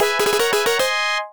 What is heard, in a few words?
jingle; James Pond 2